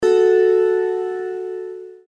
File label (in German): Zithermacher Ton E LAUTER